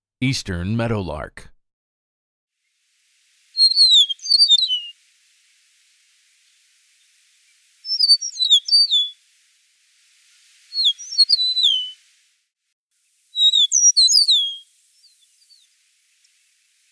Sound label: Eastern Meadowlark, bird calls; Non-Game Birds